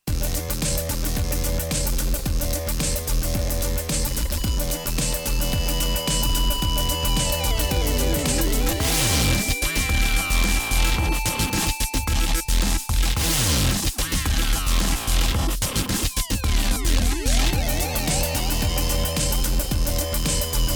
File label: moog theremini square wav plus backtrack maj penta